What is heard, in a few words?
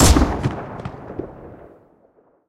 lastshot; War Thunder